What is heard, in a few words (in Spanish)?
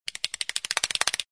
juguetes juguete